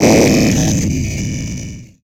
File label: Flash Blast; Multimedia Internet CD-Rom Flash